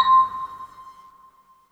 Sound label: GAMELAN C5